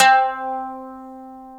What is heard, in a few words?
KOTO C3, ETHNIC; AKAI S-Series CD-ROM Sound Library VOL-8